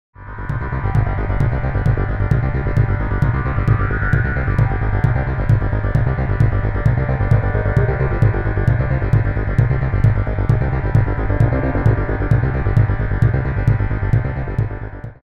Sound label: Elektron Analog Heat, Mid Drive Wet Level Modulation